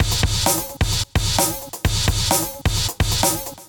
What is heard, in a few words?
Drumkit; Ableton; Factory Packs